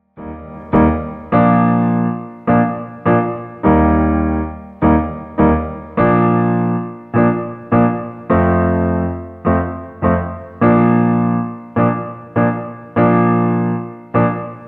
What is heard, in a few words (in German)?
Triumphmarsch